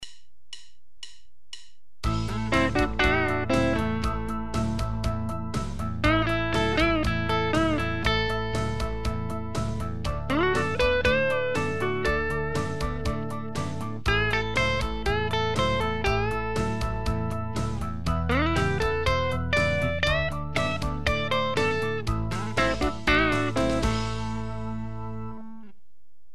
12-bar solo using Boxes I, II, and III, guitar

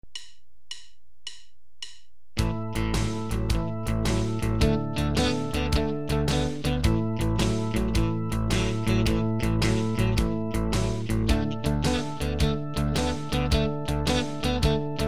12-bar blues with boogie-woogie riff, guitar

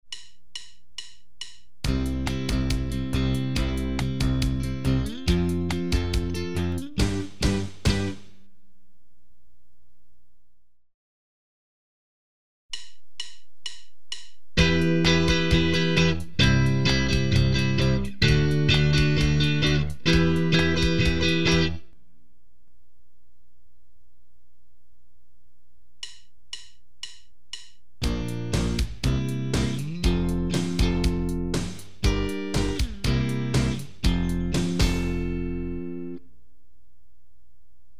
Progressions using A-based barre chords, guitar

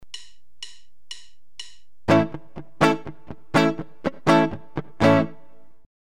Syncopation through muting, guitar

